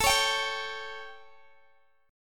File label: A 7th Guitar Open G, chord; x,x,19,18,20,17